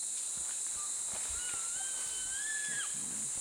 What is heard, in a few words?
combination peep whistle; השפה המורכבת של הבונוב